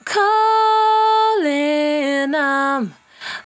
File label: 68bpm D, Velvet Vocal; Zero-G